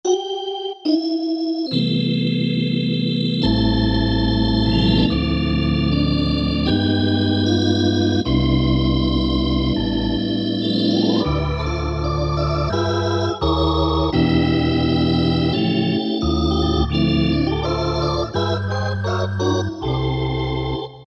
Org Theatre, Generalmusic Equinox

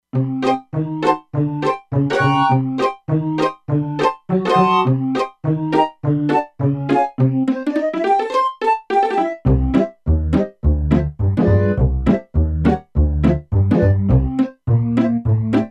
Pizz Piccolo, E-mu Proteus-2; Factory Presets

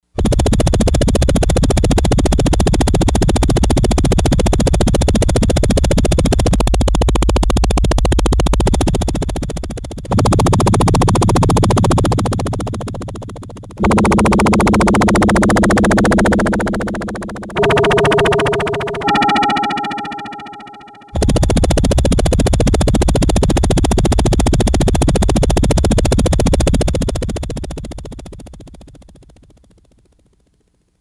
Helicopter, Yamaha DX100; Factory Patches

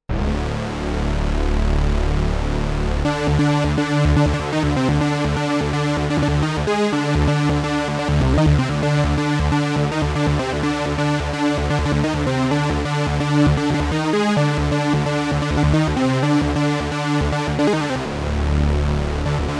Extremely Thin Synth, Oberheim OB-8

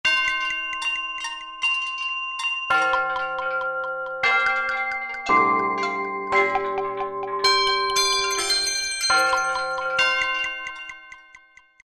Clock Shop, Korg M1; Factory preset examples

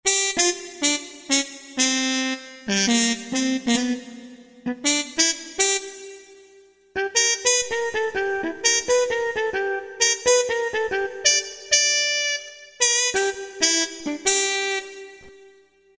Mutes To Go; Roland SRX-03 Studio SRX

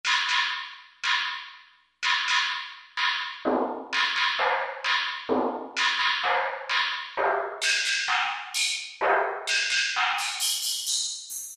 Industry, Korg T3

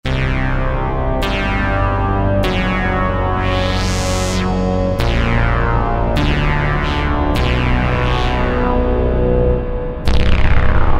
The Bomb C4, Roland JD-990; Factory Patches

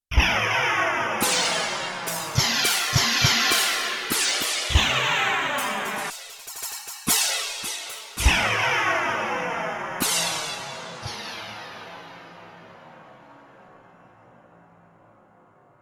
cymbal Chorus&Flange

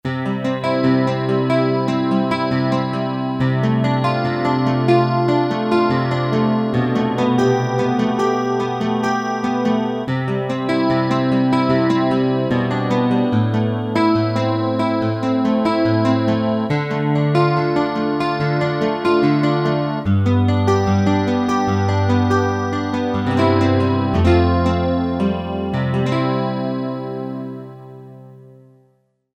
MIX, Guitars Acoust

